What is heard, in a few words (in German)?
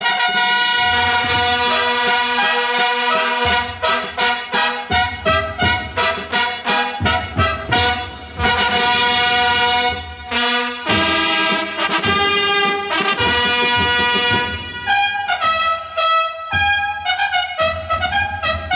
Fanfaren; Strausberger